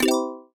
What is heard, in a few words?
result item appear; zootrivia